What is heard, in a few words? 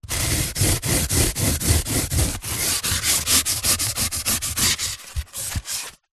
foley polystyrene piece rub against another; zapsplat